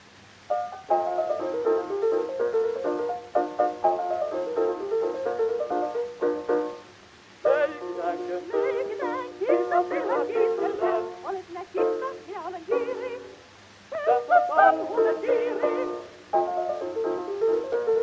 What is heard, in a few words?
Gramophone